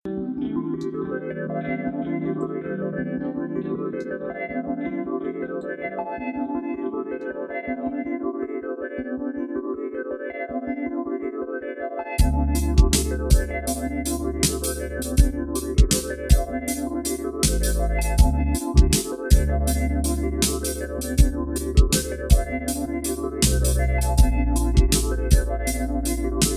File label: chillout, 432 hz; green light; Zielone światło